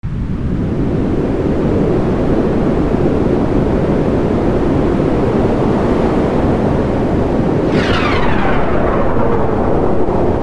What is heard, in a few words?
Piano In Wind And Tempest